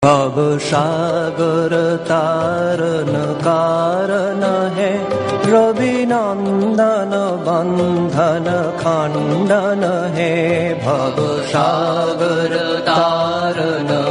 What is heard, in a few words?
devotional